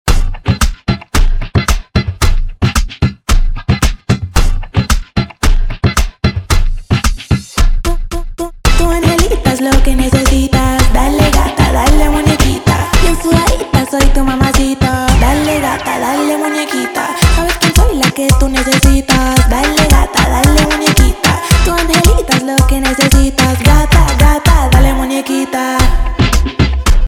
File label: House Remix bpm 112